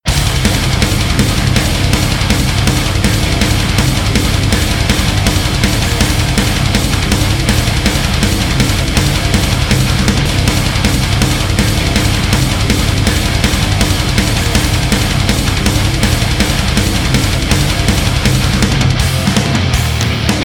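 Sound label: intro music solo extended; true admin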